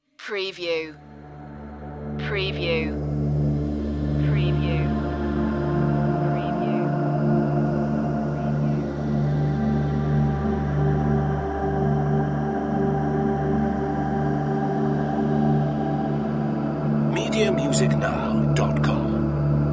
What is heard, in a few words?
haunted